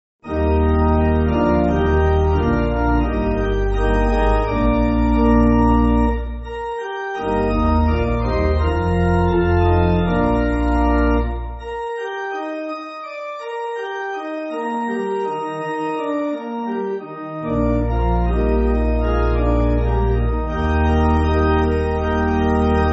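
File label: Congregational Pipe, Pipe Organ; I Found The Good Way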